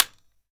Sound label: PEMETEK, WAYANG KULIT, Heart of Asia; Spectrasonics - Heart of Asia CD2